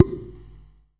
Small pot hand, Clay Pots, ethnic and world percussion